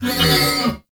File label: Ram Idle Grunt; Warframe; Duviri